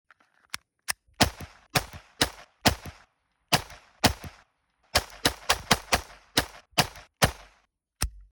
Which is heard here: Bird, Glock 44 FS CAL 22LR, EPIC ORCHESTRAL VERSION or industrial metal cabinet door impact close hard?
Glock 44 FS CAL 22LR